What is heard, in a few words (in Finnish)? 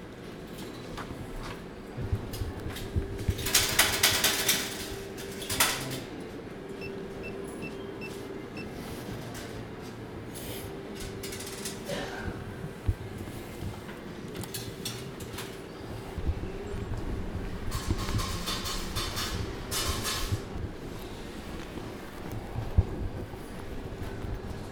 raha automaatti pankkikortilla